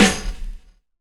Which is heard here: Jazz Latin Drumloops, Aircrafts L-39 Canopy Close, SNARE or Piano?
SNARE